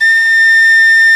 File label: P WHISTLE, Old World Instruments, Winds; E-MU Producer Series Vol. 7 – Old World Instruments